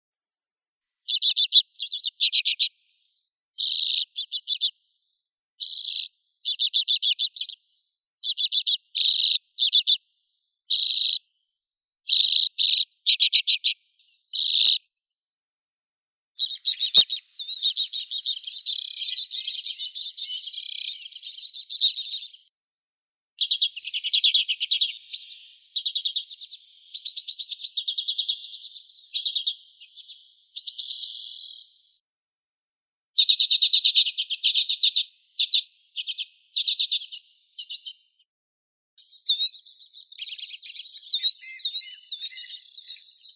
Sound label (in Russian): chechetka obiknovennaya acanthis flammea